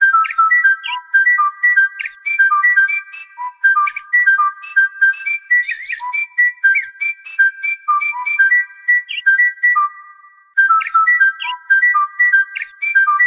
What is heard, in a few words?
Bird Whistle, alarms; LG Qua tab PZ LGT32